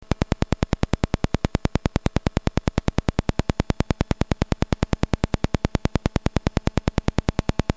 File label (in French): WIFI bande ieee802 11b